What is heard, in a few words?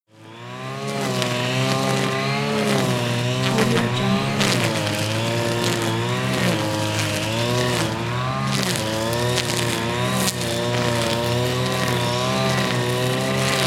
lawn mower